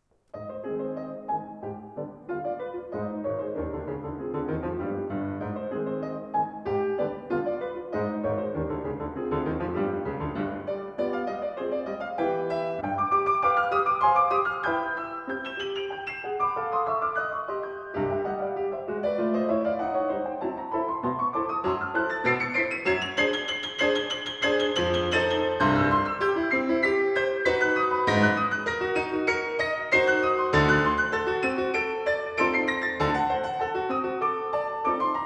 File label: Fugue in B minor, Piano